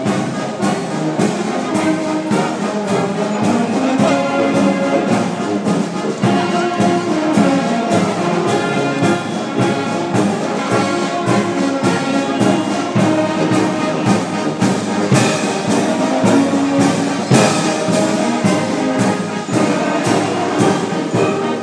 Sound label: oompah orchestra ringing in oktoberfest; blaue gans